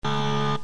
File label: bad answer